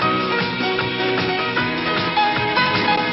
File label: 153 happy go lucky piano pop; SAMPLED MUSIC LOOPS